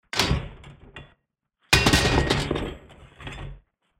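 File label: metallic mats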